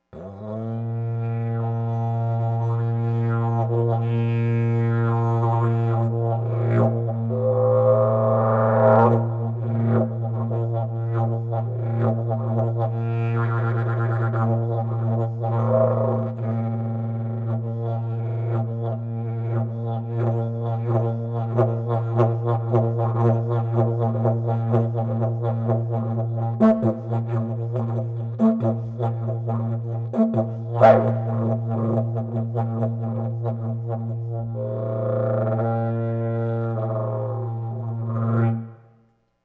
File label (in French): Didgeridoo si bemol